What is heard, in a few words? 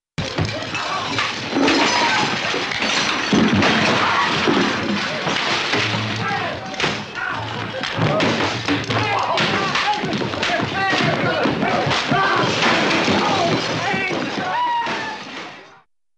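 Big Bar Fight with glass Shatters Wood Breaks & Some Yells, Cartoon; Hollywood Edge; Cartoon Trax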